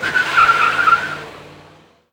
Enemy Police Car Move In

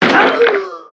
American football

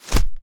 Tentacles Whip Up

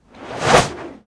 New Frozen Blade Cast; Knight Online

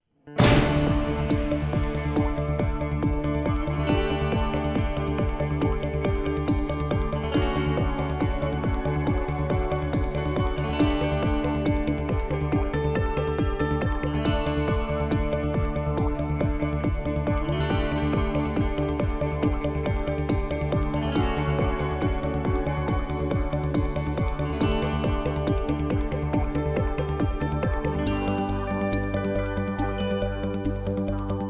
Wheel Spin Music; gamerip; Wheel of Fortune

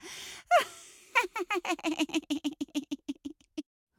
AMB China 3D Amb; rabbids multiverse; iOS, macOS, Mobile

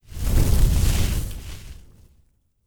Armor Passiv

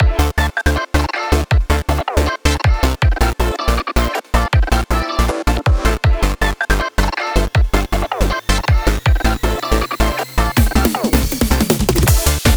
Instrumental; BLUE SMILEY

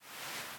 Sittable Beanbag Stand

